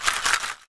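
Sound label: SHARK CALL, ethnic percussion; WorldSounds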